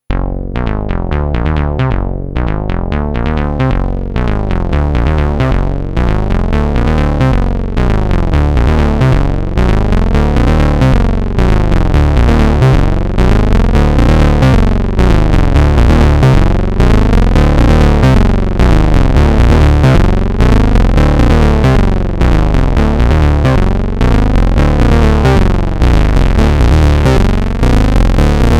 Erica Synths DB 01 Drive

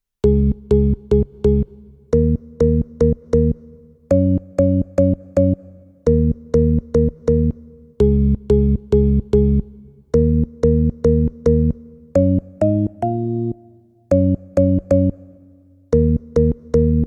Church House Organ

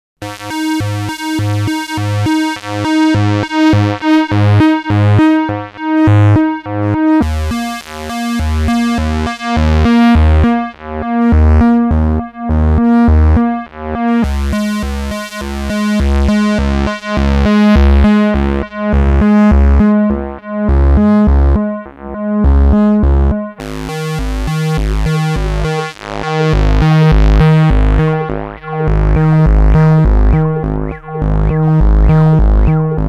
syrinx dry pulse

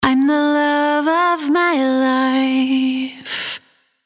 Rock Vocal; Abbey Road

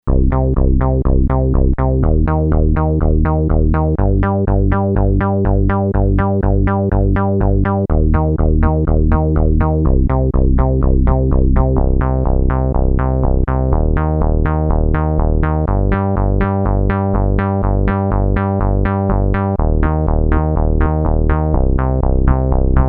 Roland JU06 vs Roland Juno106 Bass

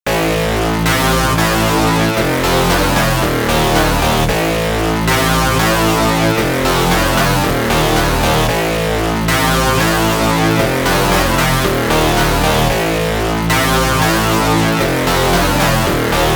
Elektron Digitone Distorted

